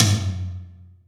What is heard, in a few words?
humus, toms; Real